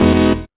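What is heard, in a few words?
house chord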